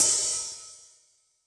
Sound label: Open, HiHat; Yamaha DTXpress-II